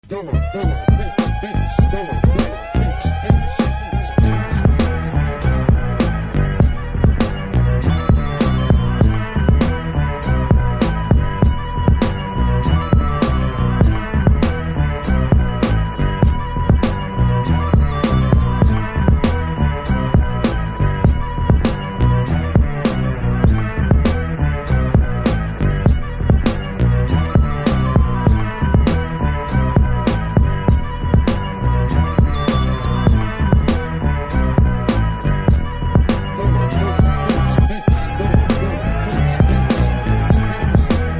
beats

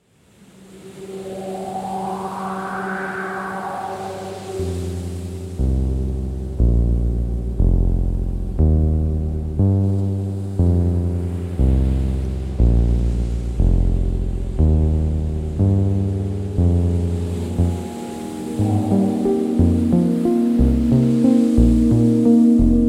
shuffle orch

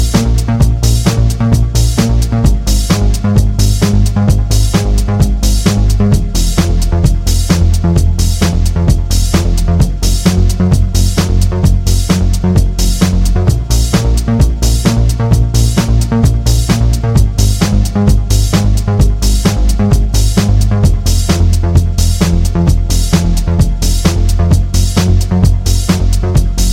Oldschool Baby (Piano mix)

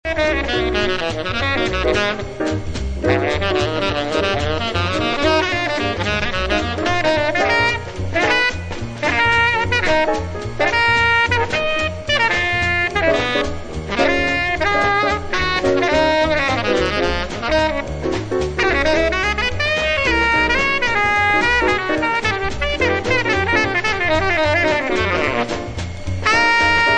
waltz

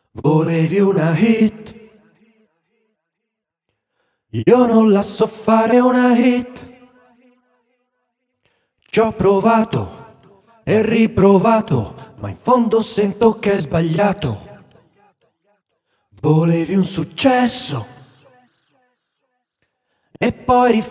(acappella bonus parts)

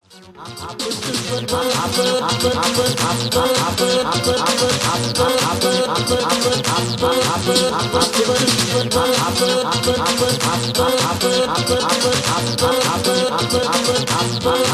Bug Beats

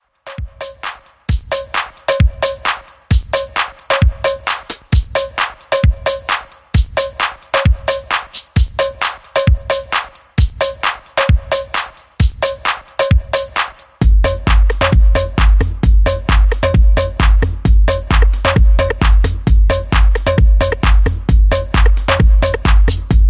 Beat

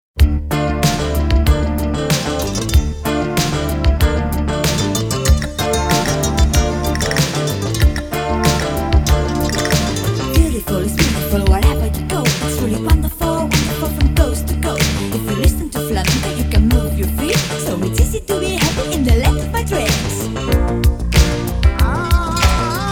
flamenco rap

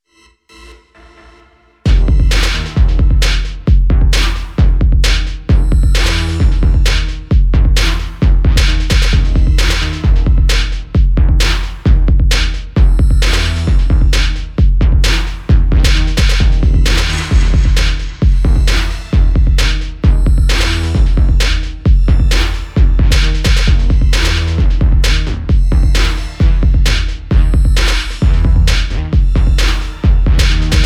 minimal vox mix

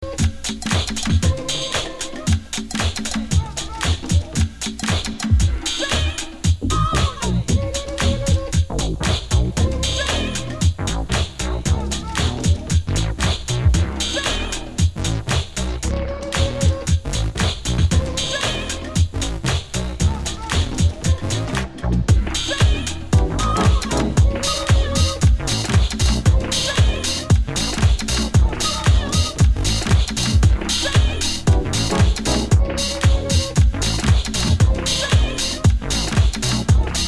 Broken Keyboard

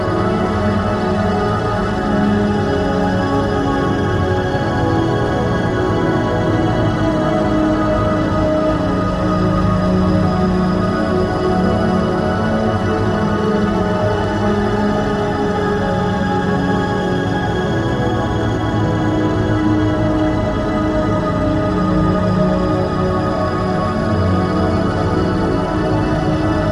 Ambient Time

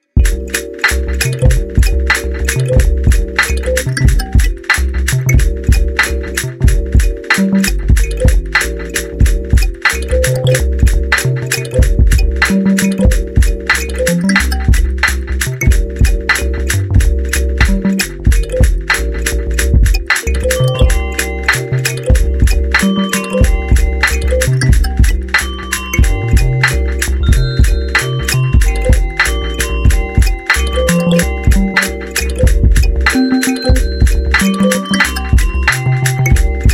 kalimba song

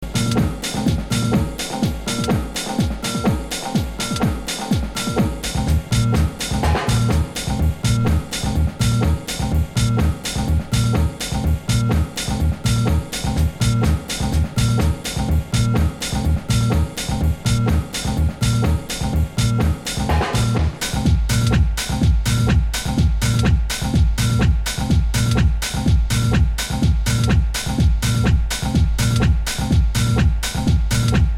Si jazz

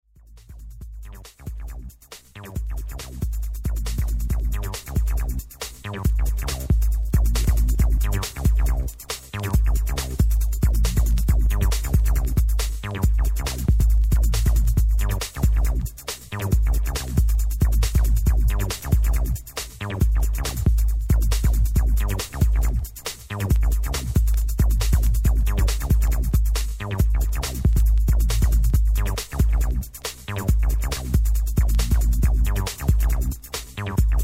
Pure Techno Sound

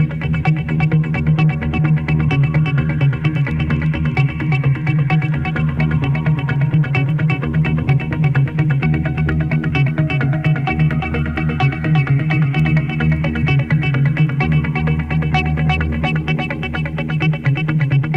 Echo Waves

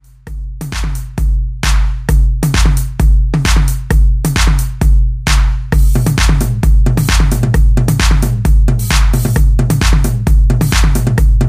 Beat

